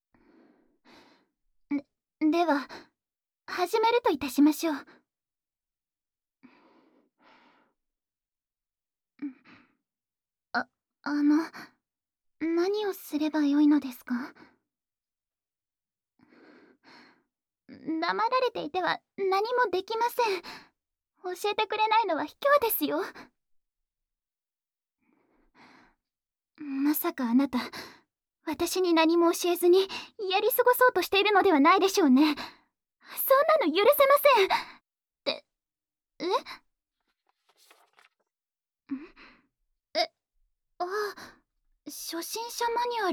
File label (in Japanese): キス; 『キスをしたら赤ちゃんが!』; ぼくは学園の性処理委員～風紀委員ハルカの場合～